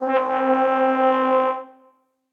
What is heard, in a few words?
long flutter, brass quartet; Ableton; Spitfire Audio; Individual